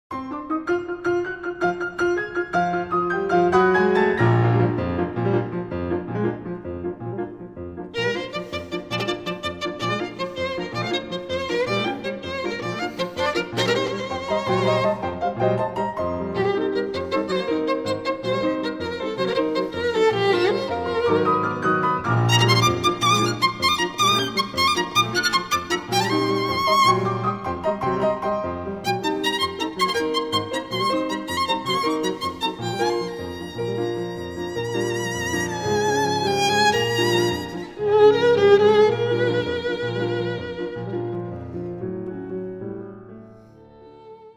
Concert Scherzo For Violin & Piano

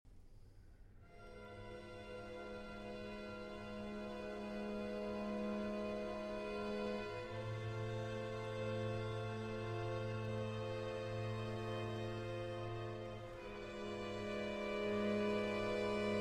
Concerto for Strings and Continuo in D minor

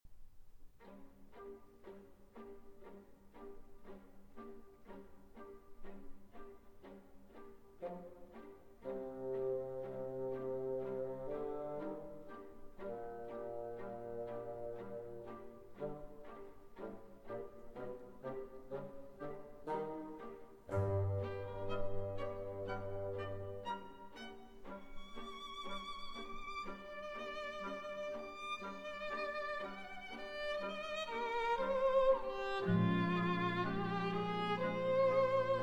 Violin Concerto No. 1 in D Major, Moderato; Prokofiev; Op. 19

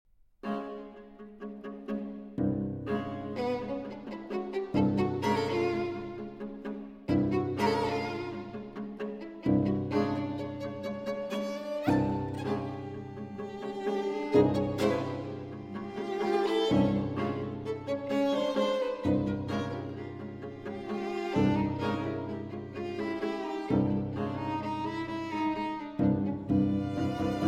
Pieces For String Quartet, Alla Serena; Schulhoff 5 Pieces For String Quartet - No. 2, Alla Serenate

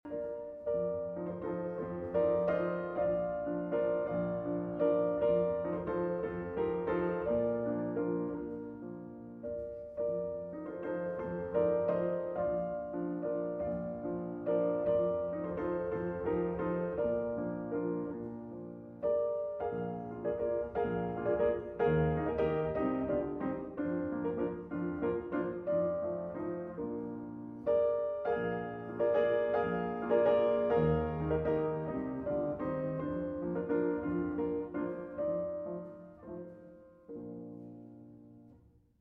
German Dances, in B-Flat Major

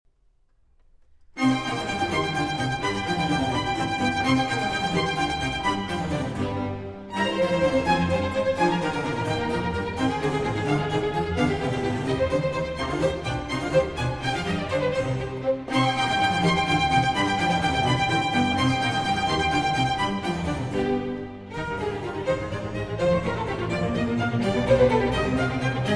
Concerto for Strings and Continuo in C minor

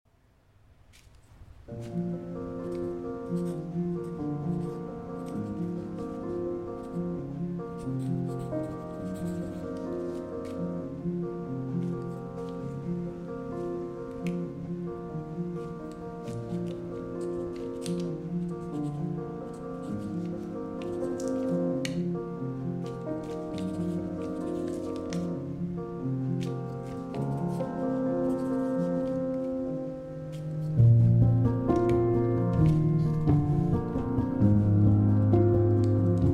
Piano Reworks

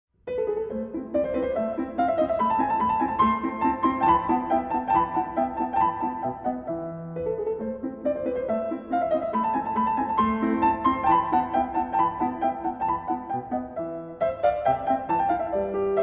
Piano Sonata in A Major

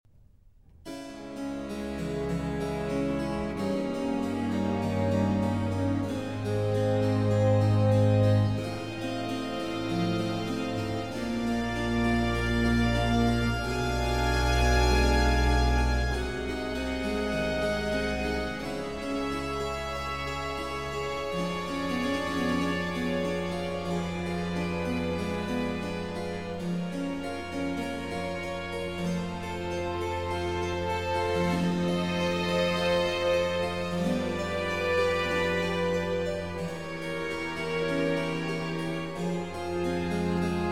Violin Concertos, Concerto in D minor

